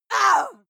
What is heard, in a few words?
female lunatic scream short